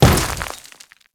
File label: Step Dirt, Footsteps; Warframe; Grineer